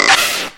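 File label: drawing of a bowstring and release of an arrow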